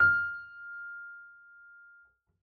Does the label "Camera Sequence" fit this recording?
No